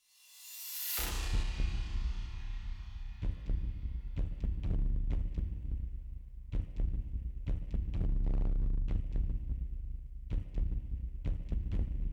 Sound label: Drums Mix; Anger Music Library